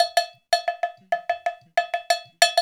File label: Island Bash; Spectrasonics - Supreme Beats - World Dance